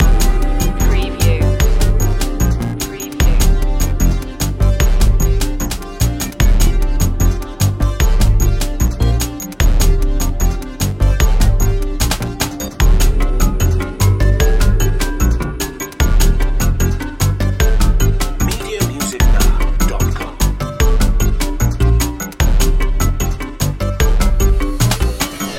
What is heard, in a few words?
digital clockwork loop